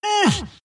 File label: wraith grunts; skeleton king